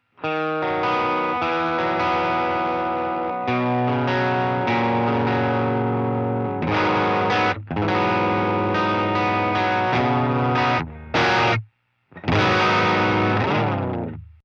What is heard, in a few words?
LINE6 aristocrat